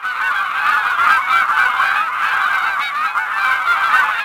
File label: geese; Truckee